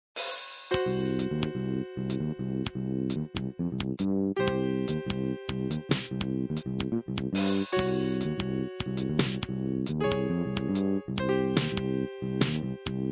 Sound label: piano blue drums